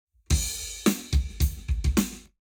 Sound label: Lab Wet Drums 90deg; eMagLS